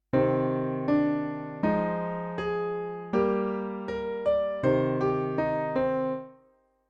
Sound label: with harmony